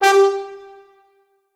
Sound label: Orchestral Brass; Best Service ProSamples vol.20; AKAI